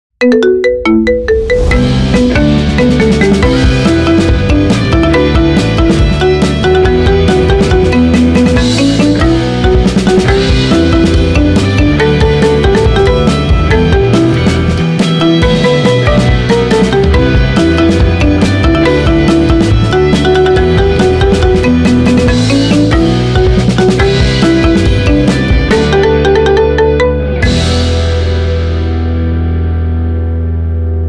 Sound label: Marimba Ringtone; Full Metal Alchemist; Anytunz